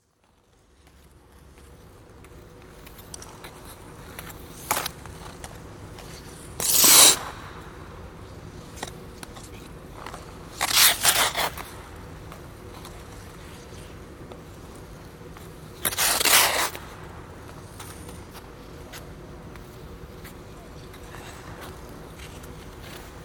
Ice Skating, one person circling close, others in the distance on indoor rink; BBC